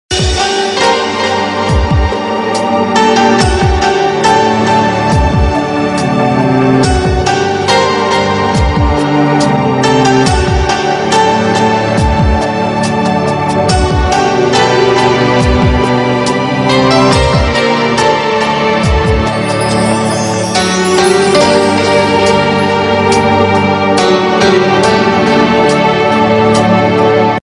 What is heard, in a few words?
Instrumental Tune; Sasural Genda Phool